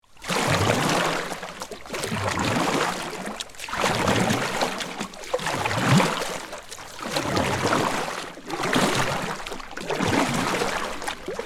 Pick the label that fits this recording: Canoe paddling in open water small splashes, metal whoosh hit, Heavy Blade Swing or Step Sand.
Canoe paddling in open water small splashes